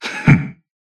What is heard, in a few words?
Throw Whiff; BBCF; Susanoo